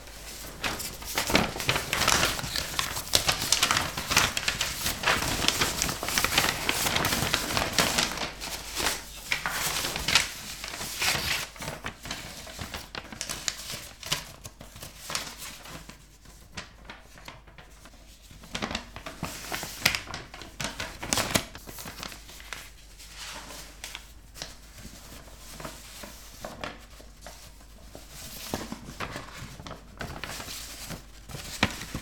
Handling Paper

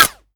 Silenced Gunshot

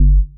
Sub Bass Stab G

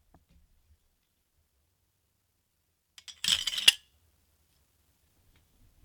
Union Sword Sheath

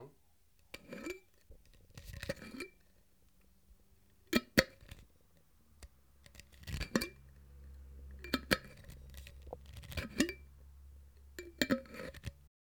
Open Close Jar

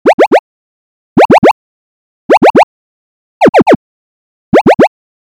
Loud Electronic Chirps

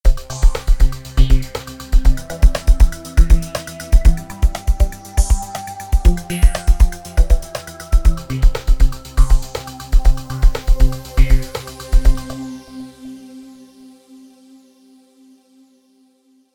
Sonic Phrase Intro

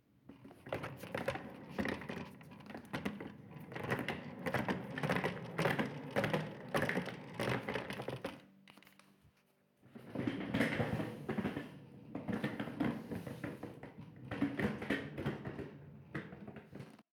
Wheel Chair

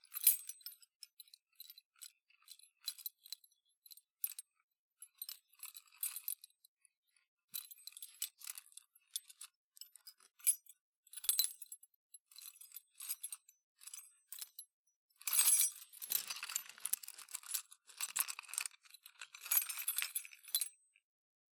Keys Jangling

